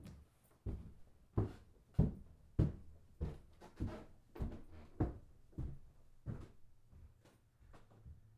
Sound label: Walking Upstairs